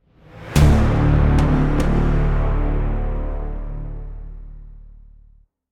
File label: combat stings defeat; Griftlands